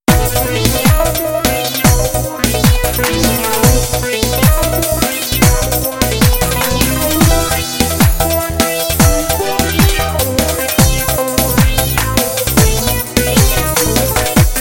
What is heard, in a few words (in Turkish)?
roman ritmi, Roland E-A7